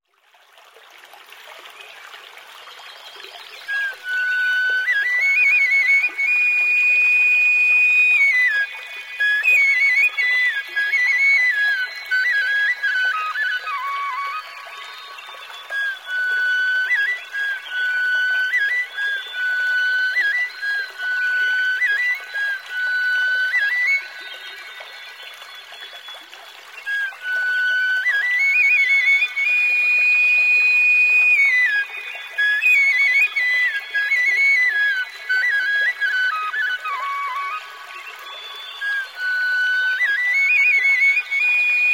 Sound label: Meditation